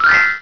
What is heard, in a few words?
magic; IFX